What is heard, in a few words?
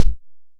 Kick Fat Short, Tama TS-206